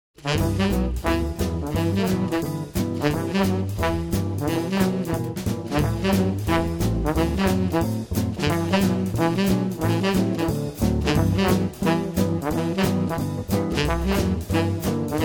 quintet, Swing

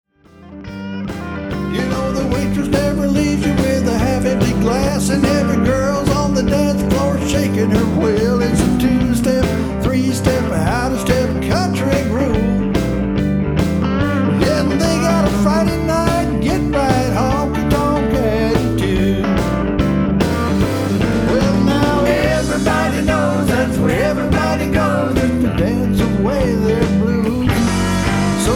Honky Tonk, country band

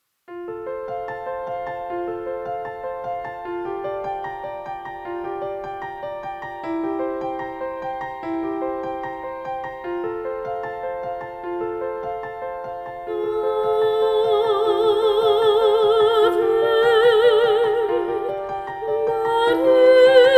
Mezzo Soprano Voice and Piano

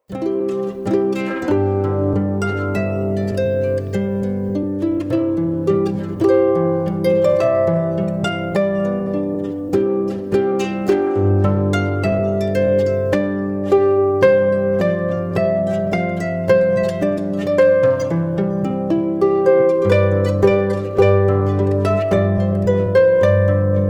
trumpet Tune, Harp; Henry Percel

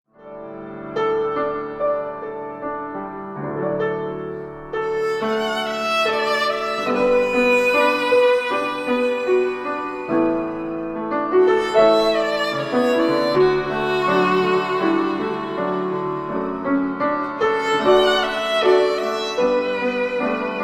Violin And Piano Duo

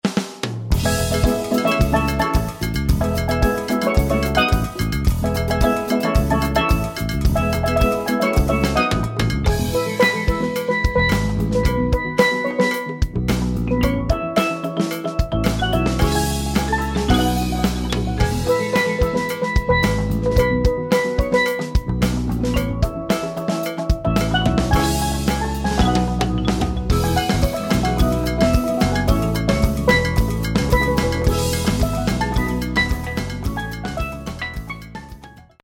Steel Drum

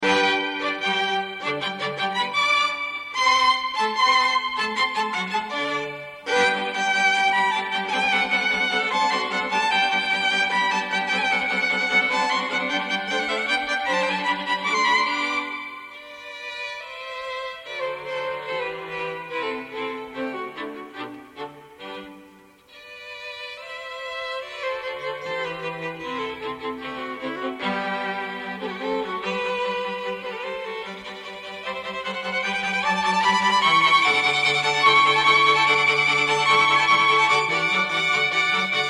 string quartet

